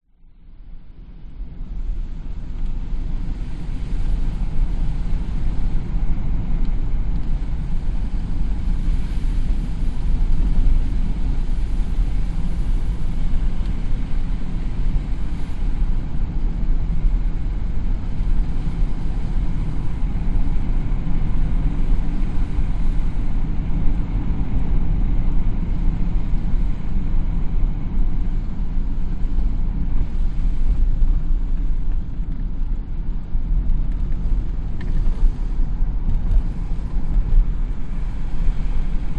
vehicles car driving road smooth wet windows open slightly internal binaural